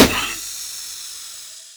Car Tire Pop; Warframe; Nineteen Ninety Nine

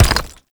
Mech Step Surface Rock; Vehicles; Step Surfaces; Warframe; NechroMech

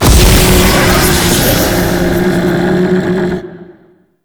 Cave Combat Trap Action; Warframe; Duviri Murmur; Cave Combat Encounter

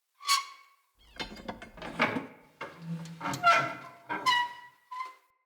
Light Mirror Hang Squeak; Puzzles

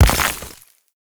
Mech Step Surface Dirt; Vehicles; Step Surfaces; Warframe; Nechro Mech

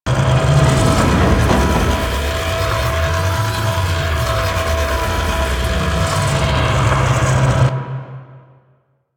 Crew Ship Core Reveal; Warframe; Corpus; Railjack

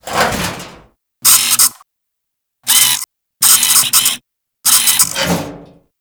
Drone Hand Movement; Warframe

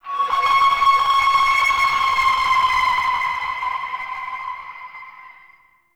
Tonal Nightmare; Ambience; Warframe; Shadowgrapher

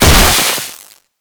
Infested Survival Purification Weakpoint Destroy; Warframe; Nineteeen Ninety Nine

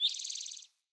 Bird Song; Vocals; Animals; Pothia Bird Guide

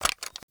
Assault Rifle Reload Handle; weapons; Warframe; Grineer

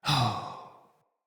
Death, Battle Barks; Envoy

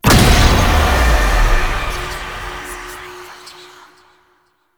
Apparition Impact; Warframe; Dagath

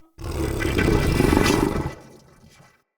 Corrupted Battle Dog Idle Growl; Animals; Vocals

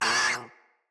Vocal Pain; Warframes; Khora

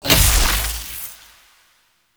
Globelight Turn On; Warframe; Zariman

